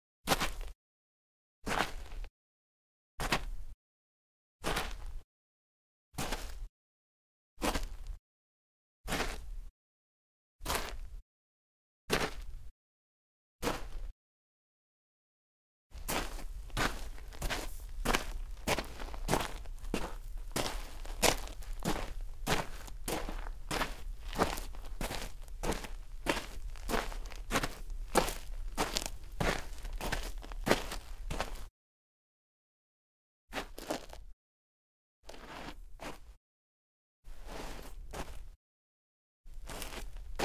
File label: Dirt, Foley Footsteps; Sound Ideas